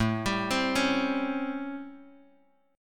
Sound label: A 7th Sharp 9th, Bass Standard; A7#9; arpeggiated; JGuitar